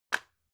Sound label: drug package drop soft; arzt